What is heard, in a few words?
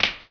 Jump